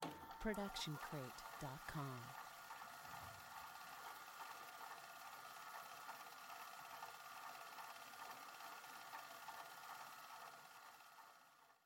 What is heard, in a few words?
VHS forward; SoundsCrate